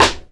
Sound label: dry steel snare drum b key